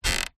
household mattress sit on squeak

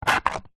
leisure frissbe plastic pick up from wood surface

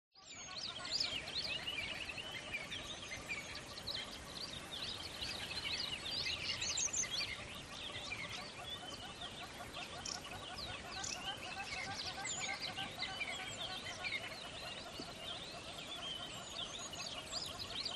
ATMO BUSH Water Hole Early Morning; smartsound

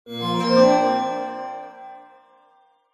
multimedia game sound positive magical

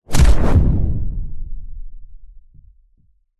science fiction weapon bullet hit whizz

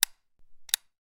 gun ak 47; still north media

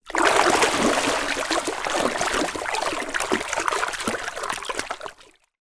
water swishing swirling with hand 2 foot deep

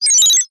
science fiction robot beep vocal chirp

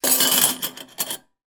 household cutlery handful down on plate; zapsplat

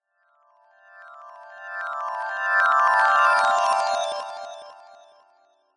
fantasy magic glissando reversed bright twinkling

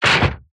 impacts metal crunchy hit sweetener

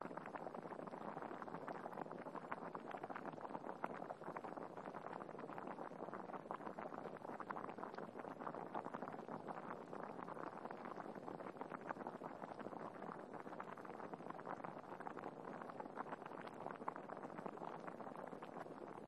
household kitchen cooking water rice boil pan

